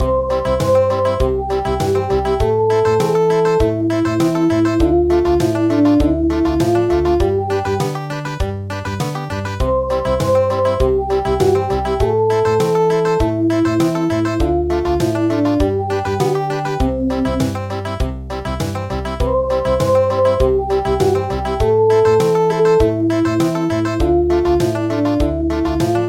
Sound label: Country; Bunny